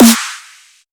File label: drum hit whistle